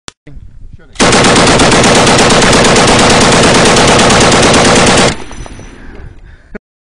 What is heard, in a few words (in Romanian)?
militar mitraliera